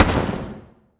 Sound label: asteroid damage carbonic medium; Factorio